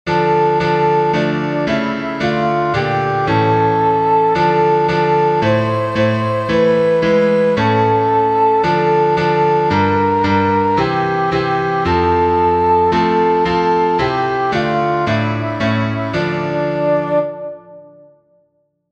heinlein soprano

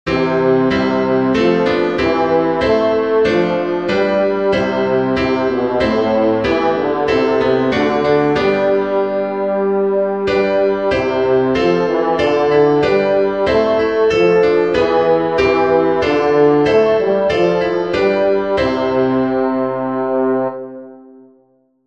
st anne bass; by William Croft (1678-1727)

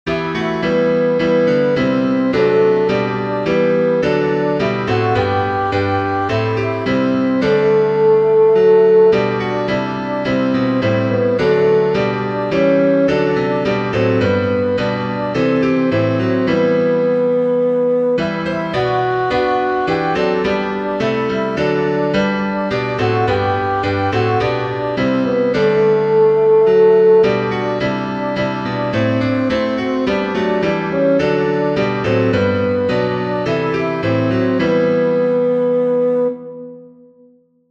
kingsfold alto; I Heard the Voice of Jesus Say

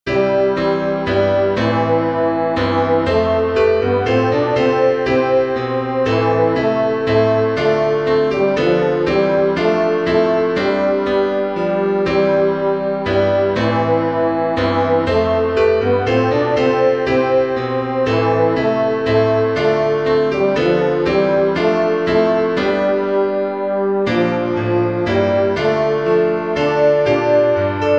abbots leigh tenor

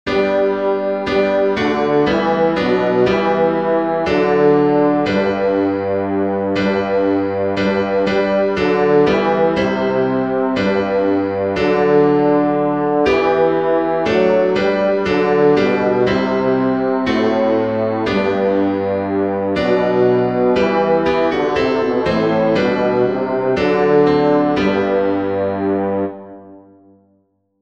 old hundredth bass

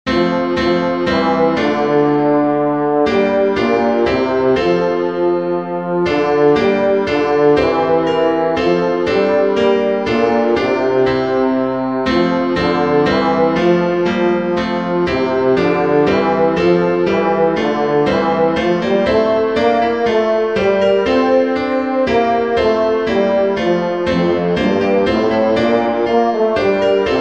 lambillotte bass; LAMBILLOTTE “Come Holy Ghost”

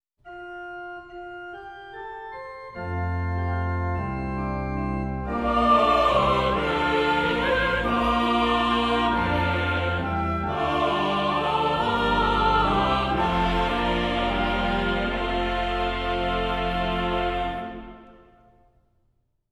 amen; landofrest; land of rest mass; Holy, Holy, Holy

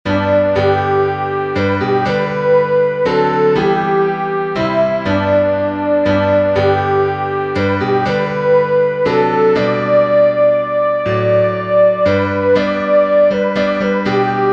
new britain sound soprano; “Amazing Grace”

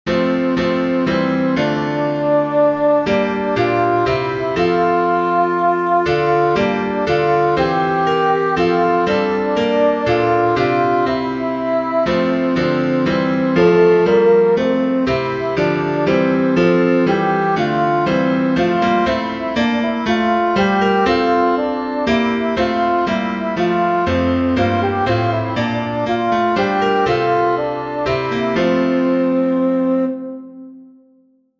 lambillotte alto; LAMBILLOTTE “Come Holy Ghost”

